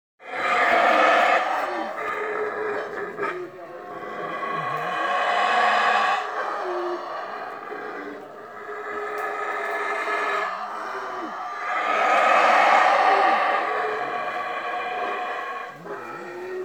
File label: Howler Monkey